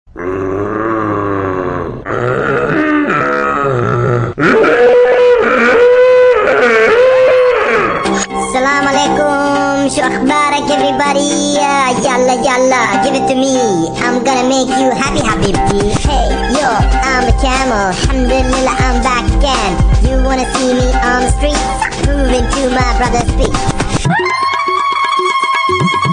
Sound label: funny dog voice tone